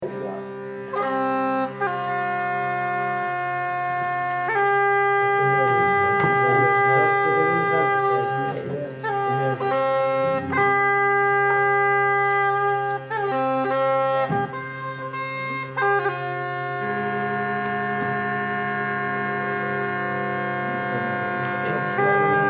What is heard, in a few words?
Tuning drones; 2007 Farrar Interview; In the Big Room; Ronan Browne